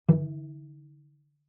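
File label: Cello pizz fortissimo sul C Eb3; MIS Pitches - 2014; Strings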